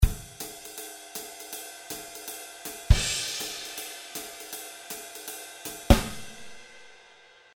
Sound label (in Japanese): シンコペーション